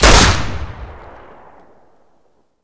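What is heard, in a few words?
Weapon 44 revolver fire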